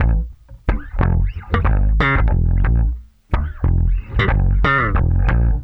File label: BASS, Funky Ass Loops; East West